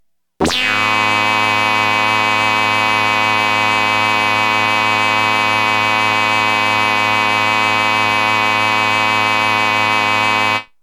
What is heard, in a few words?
breaks; Super Duck Breaks